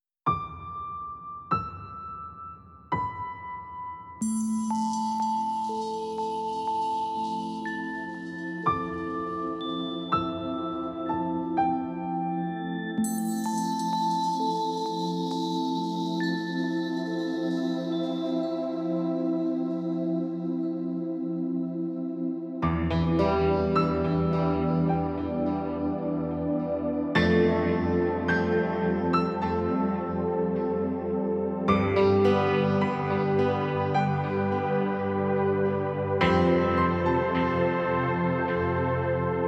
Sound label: Instrumental